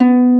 HARP; East West; Global Sounds

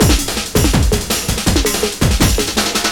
LOOP, Eurotech; Sounds Good

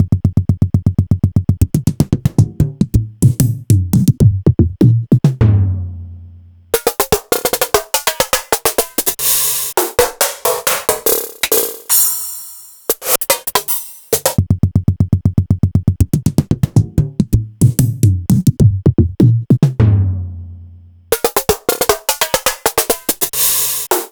Korg Triton BD kit

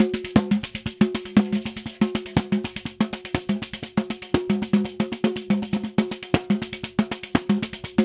RIO, Rio Batucada

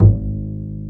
PIZZ BASS; E-MU Formula 4000 Series Vol. 2

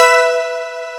HOUCEY CHORD; AKAI S6000 CD-ROM - Volume 1; SYNTHESIZER

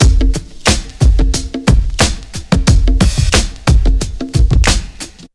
TRIP 90, trip loop

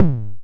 Stabs, Elektron MachineDrum

